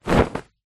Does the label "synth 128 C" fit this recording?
No